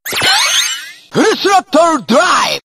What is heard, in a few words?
full throttle drive